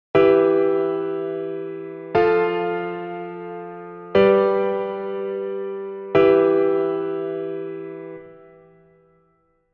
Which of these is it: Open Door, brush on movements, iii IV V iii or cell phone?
iii IV V iii